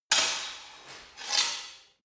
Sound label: sword hit scrape fast sword